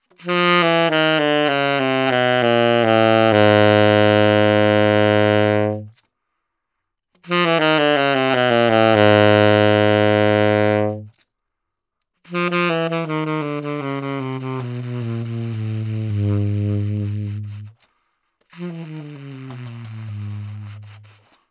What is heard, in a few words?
Low Notes; BSS